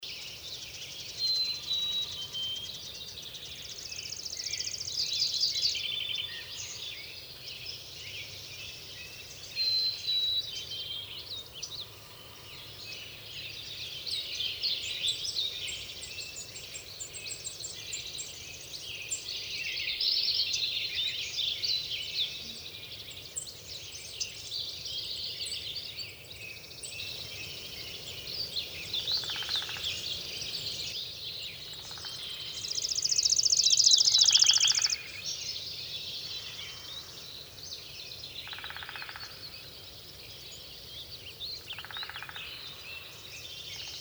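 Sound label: Dawn Chorus, Yellowstone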